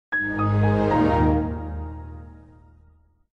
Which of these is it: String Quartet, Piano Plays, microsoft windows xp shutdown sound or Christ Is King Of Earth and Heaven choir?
microsoft windows xp shutdown sound